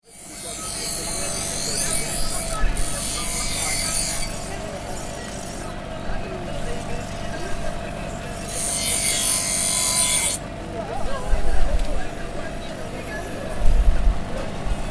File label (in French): tiznit remouleur; resonances oasiennes